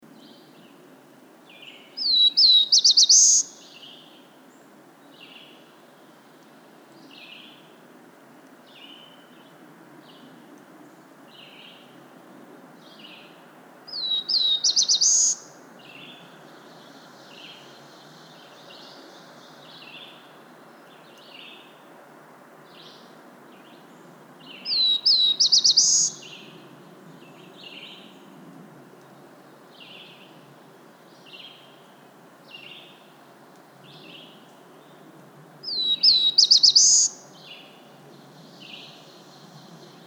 Black-throated Blue Warbler Setophaga caerulescens